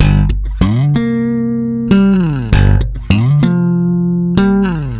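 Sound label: G minor, Bass, 96 BPM; Ultra Freakin' Bass; Sampleheads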